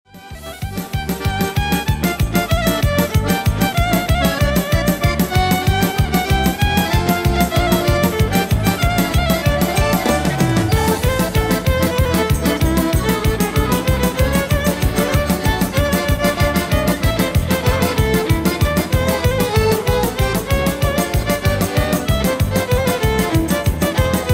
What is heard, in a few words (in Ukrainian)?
коломийка ямаха genos